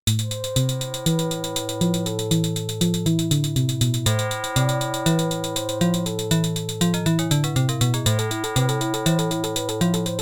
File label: OPL2; Yamaha OPL2